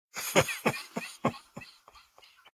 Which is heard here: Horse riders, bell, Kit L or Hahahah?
Hahahah